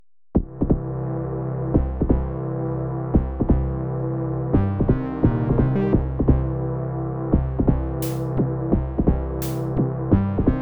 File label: wah wah wah; weeklybeats 2024